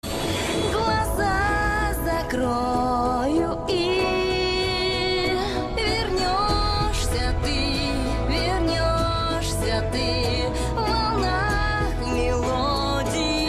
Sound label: Instrumental; Winx Club